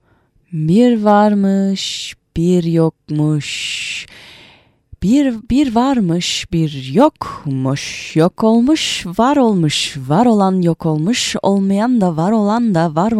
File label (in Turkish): rap